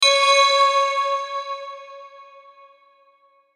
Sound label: Hit Bell Strings Swell Reverb; Production Element Imaging Element Accent Transition